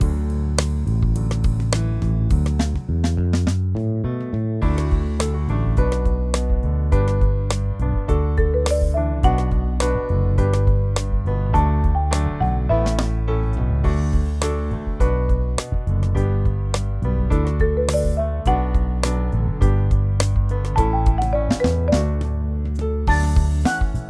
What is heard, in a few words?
INSTRUMENTAL; sofia e os gatos da livraria